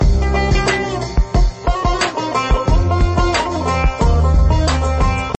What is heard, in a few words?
Gypsy Beats